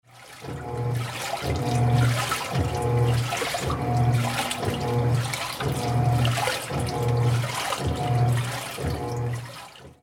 세탁기

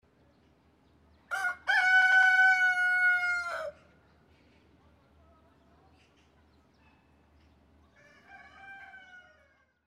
닭

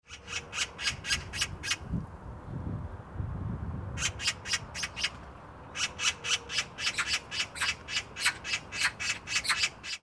까치

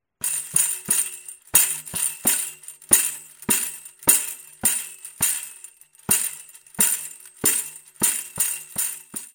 탬버린

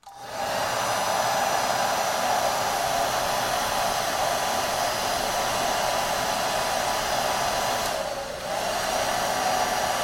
드라이기

